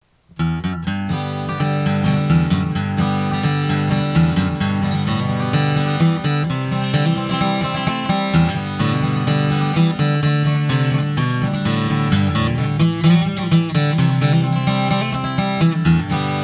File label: Bluegrass, RainSong DR1000